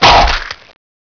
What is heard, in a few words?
mushroom pop